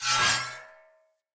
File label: Melee Whoosh Accent; Weapons; Swords; Dendrit Short Sword; Vetch